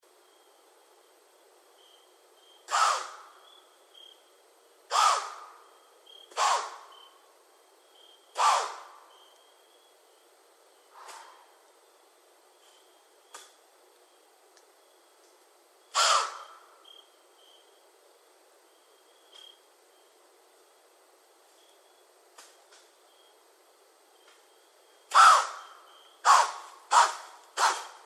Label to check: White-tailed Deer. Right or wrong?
Right